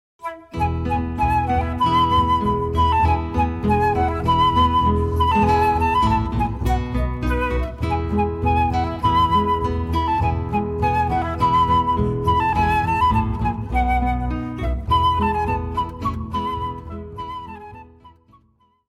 guitar flute bass trio; Four Seasons, Spring

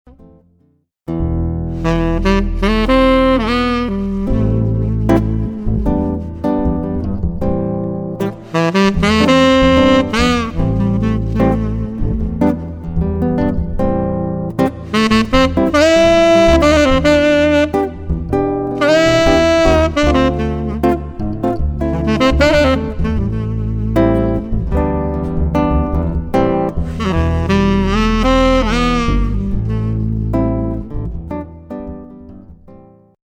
Jazz Trio

